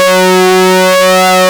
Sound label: SYNC, ARP Synths; Analog Odyssey; E-MU Formula 4000 Series Vol. 3 – Analog Odyssey